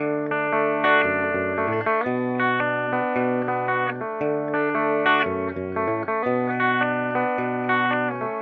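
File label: electric guitar, guitar; egtr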